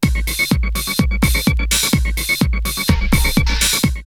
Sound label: drumloops Rave 126; X-Static Goldmine; 90 s Sample CDs